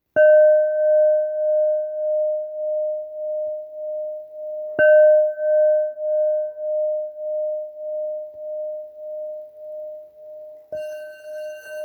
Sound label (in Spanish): Rojo re 612hz, cuencos tibetanos